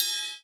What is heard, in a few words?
Tick Cymbals, Metronome; Audition